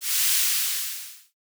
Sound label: sizzle sound